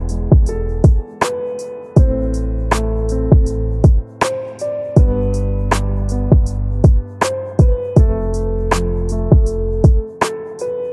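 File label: sad piano hip hop beat ringtone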